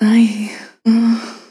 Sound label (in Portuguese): vocal one shot gemido